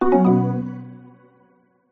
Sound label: multimedia alert short 3 notes mallet synth error incorrect; zapsplat